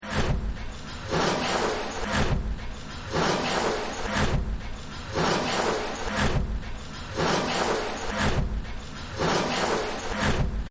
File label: Factory; Factory 06